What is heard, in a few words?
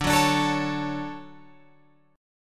D Major 7th, Guitar, Open F; DM7 strummed